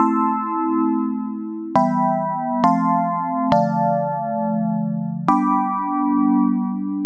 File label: 136 wobbly harmonic chords